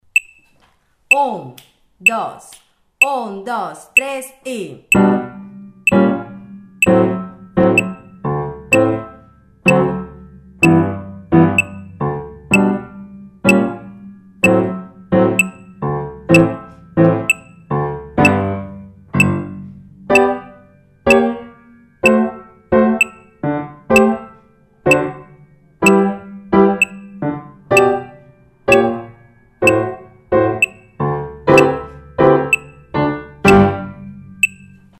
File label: Conga